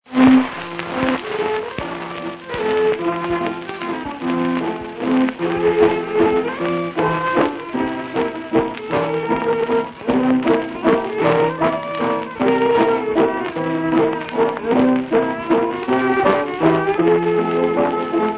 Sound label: Waltz